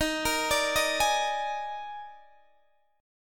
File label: Eb Major 7th Suspended 4th, Guitar Open D